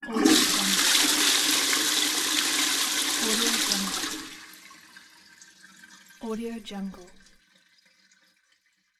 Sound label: the flushing toilet